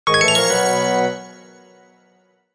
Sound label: Power up retro achievement mobile game